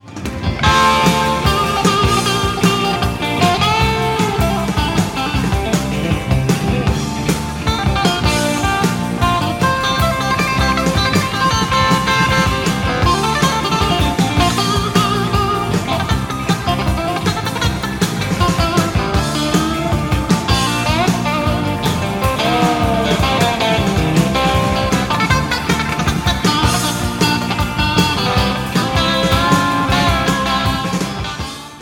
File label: Schecter Strat Red, guitar